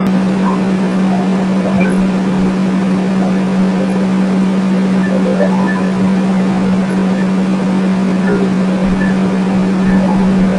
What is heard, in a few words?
speak thinking modulation tone